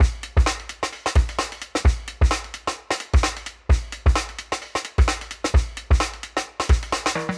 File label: 130Bpm Breaks Loops